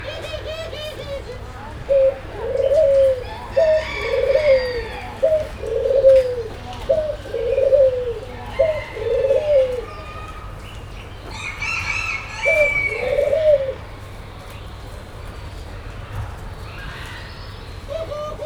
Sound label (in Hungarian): kukru nevetessel, kacago gerle; nyiregyhazi zoo 2019